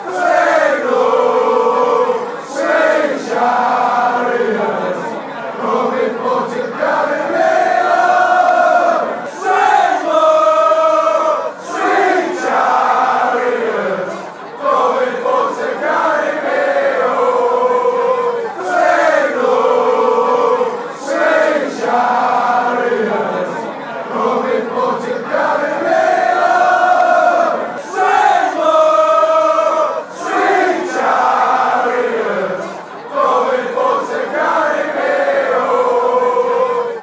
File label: swing low sweet chariot, fanchants